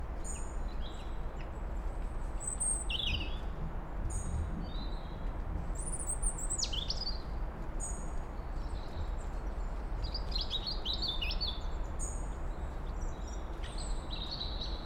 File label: Autumn Morning